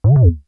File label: drone activate; spacetac